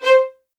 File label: MED ATT C5, soft violins; Q Up Arts; Denny Jaeger Master Violin Library